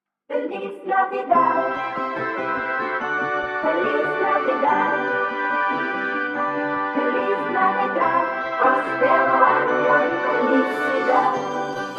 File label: Merry Christmas Drill Remix